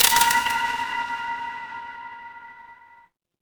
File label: magic scifi portal